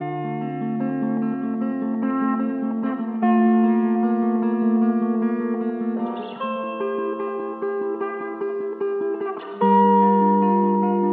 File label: LOFI AMBIENT SONGS